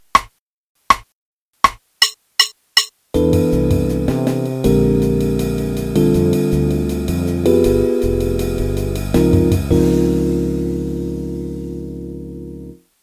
Play-a-long C Major Scale, to the 9th and down qn=160, Jazz Piano